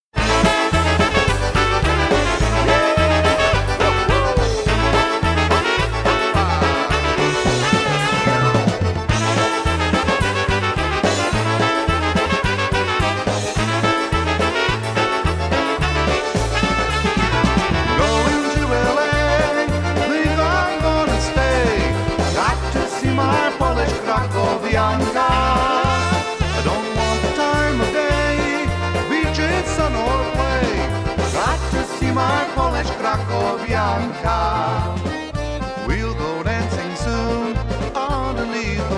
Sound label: Polka